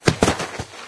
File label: body drop; Items handling